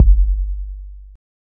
subby kick single shot g key

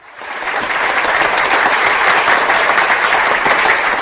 public applause

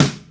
damped steel snare drum sound C sharp key

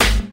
00s short acoustic snare g sharp key

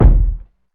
dope bass drum one shot E key; kick drum samples

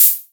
urban open high hat sound d key

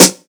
original snare sound f key

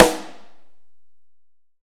deep steel snare drum E key; snare drum samples

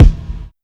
tight kick sample d sharp key; Royality free kick drum sound tuned to the D# note. Loudest frequency: 182Hz

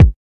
00s wet kick drum sample f key

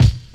00s natural bass drum sample a sharp key

